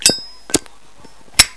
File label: klick, zippo